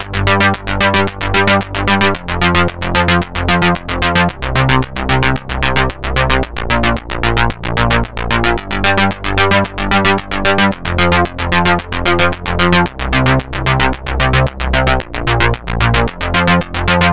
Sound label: G 112 Cowboy, Discostep Bass Loops